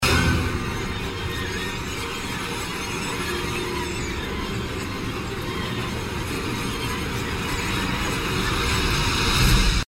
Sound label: See Loop; SFX Slendrina; Granny 3; Android